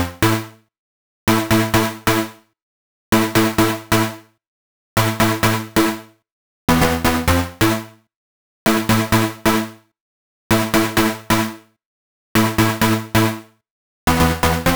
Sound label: 130BPM Melody; Vengeance Samples; Pressure 2 Kit